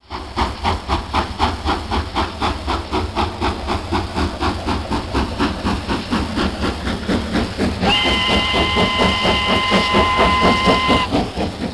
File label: trains start